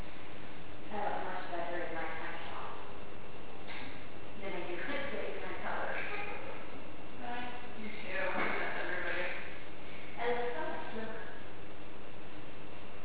child laugh

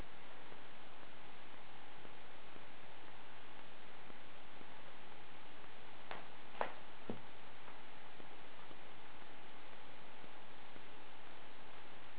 house noise during quiet time

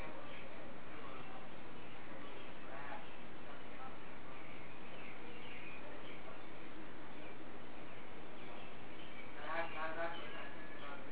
meow 3 times; axemurderhouse

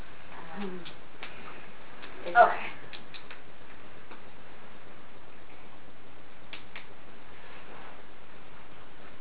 clapping hands again

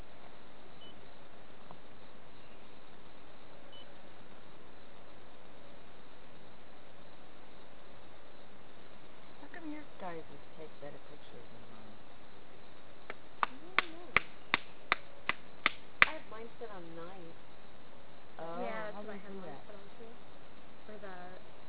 wierd tapping

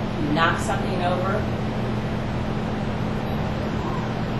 knock somthing over

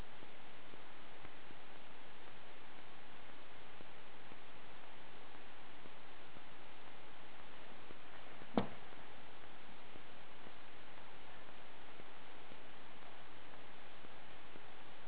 house thump during quiet time

